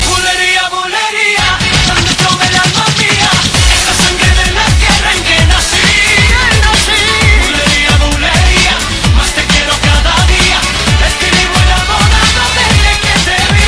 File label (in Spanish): buleria; latino